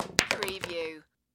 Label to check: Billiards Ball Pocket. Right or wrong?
Right